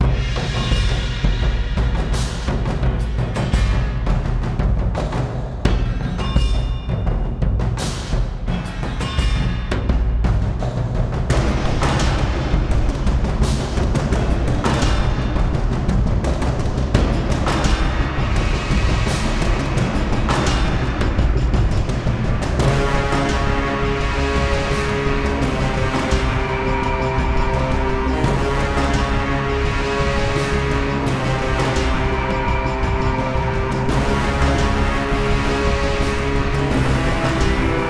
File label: Towerdefensemusic Loop Stereo; Advanced Gaming Platform Epica